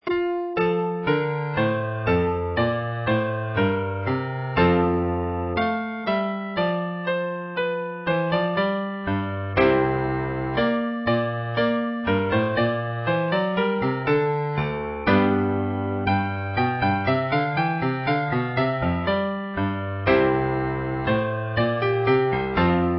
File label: Chorale Setting F; Bach; BWV510